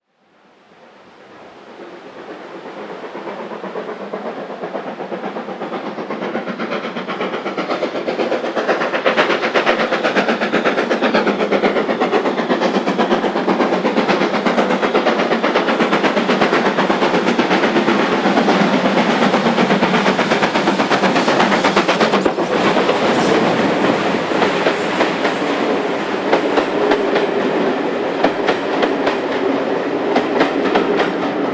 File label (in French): locomotives a vapeur; sonotheque